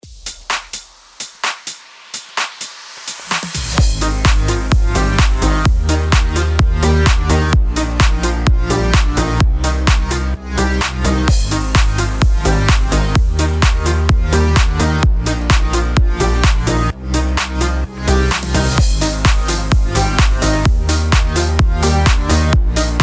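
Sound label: BED FUTURE HOUSE G# major 128BPM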